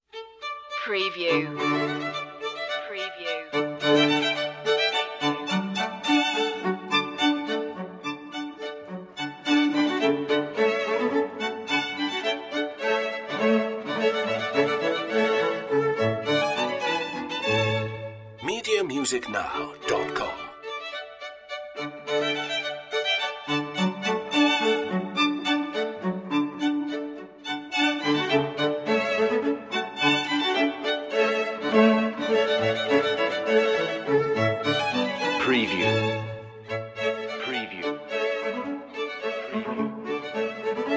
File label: string quartet; handel; music for the royal fireworks; hwv 351; la rejouissance allegro